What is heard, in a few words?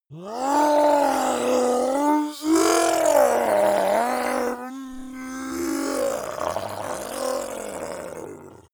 zombie human change